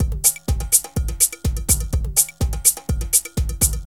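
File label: drum loops, left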